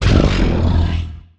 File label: Humanoid Grunt Breath; The Decline Of Warriors; Monster Sounds Volume II